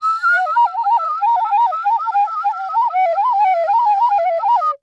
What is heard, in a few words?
Cartoon Flute Fluctuate; AdobeCC